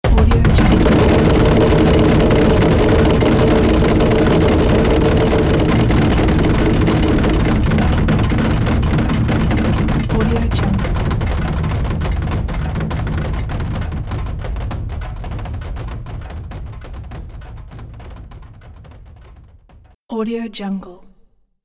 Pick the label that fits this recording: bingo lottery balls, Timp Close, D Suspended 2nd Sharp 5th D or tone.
bingo lottery balls